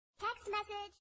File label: text message